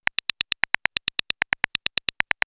Space Pecker, synthfx; Concussion; X-Static Goldmine

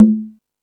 Latin Drums, E-MU Pro-Cussion; drum machine

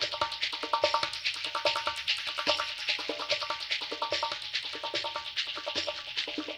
BOLERINO; Spectrasonics; Liquid Grooves 1 & 2

